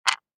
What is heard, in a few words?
DICE on Wooden Tray, Drop, Standard, 6 Six Dice; Samples; ArticulatedSounds; DICE Sfx Party (Extended)